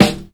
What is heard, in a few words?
puffy snare sound f key